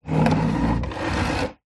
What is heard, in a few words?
household chair wooden old slide scrape wood floor recorded under seat